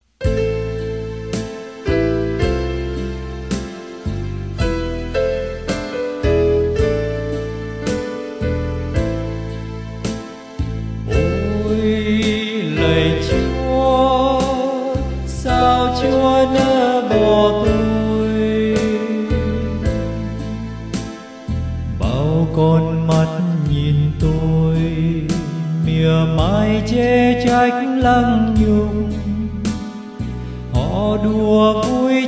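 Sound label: soprano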